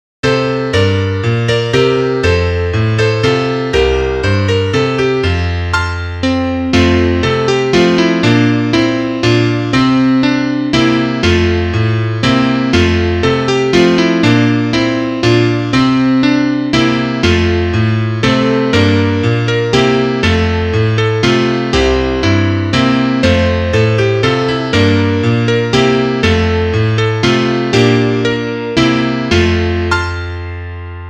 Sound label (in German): Klavier; Liederbuch; zum tanze da geht ein maedel